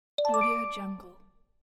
points up

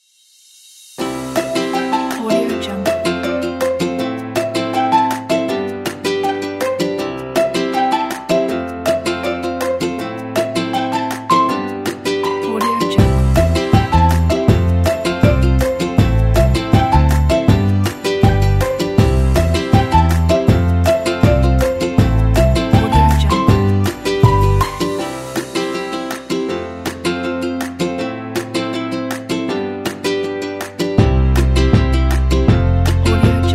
time for fun

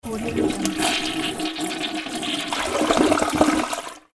toilet flush; floraphonic

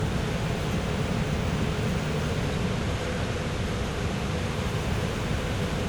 old italian diesel train interior moving loop

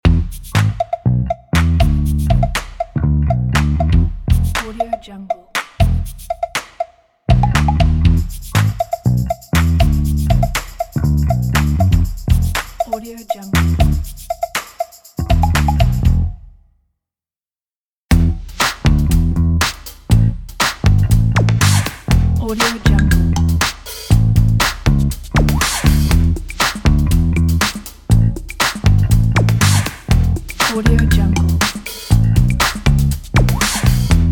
bass guitar logo pack

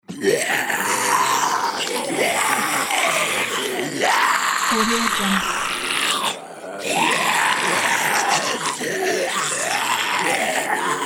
group of zombies

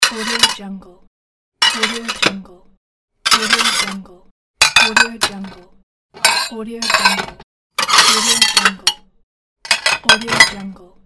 dishes pack; am-bi-ence

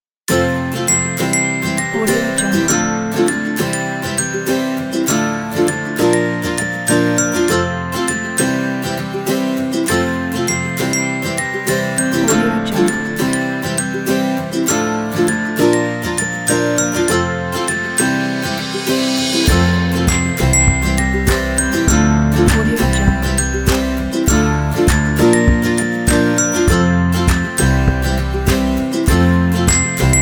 happy guitar

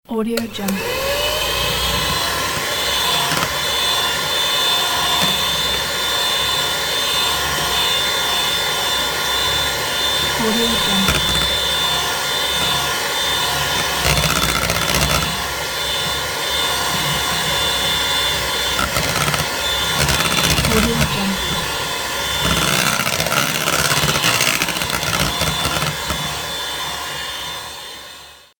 mixer

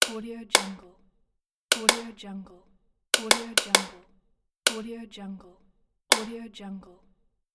the light switch lamp

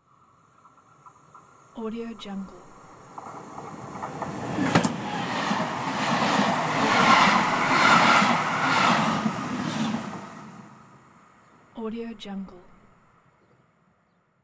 train

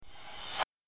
sweep swipe swoosh sound; clublime

